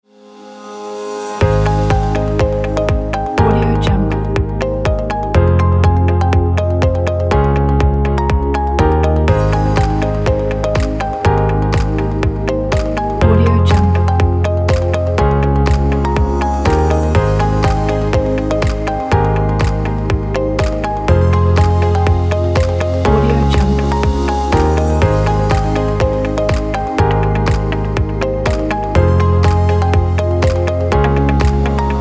lively hopeful

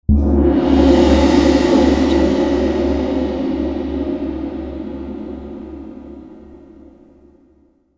cinematic gong

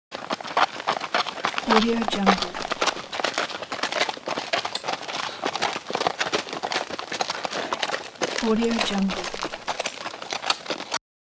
horse galloping

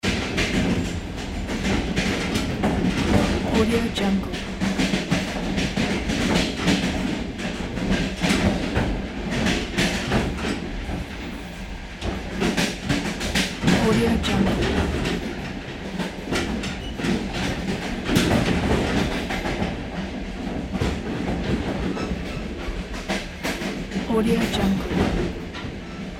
train

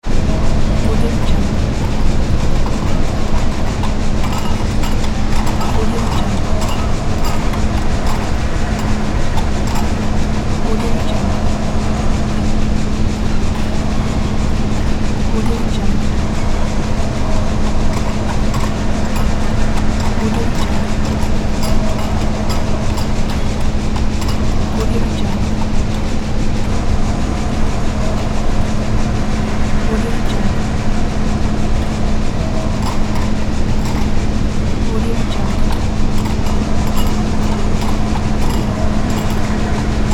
industrial background; alenalt

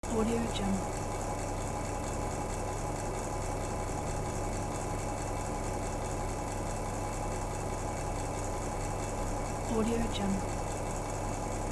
ventilator

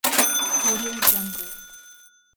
money

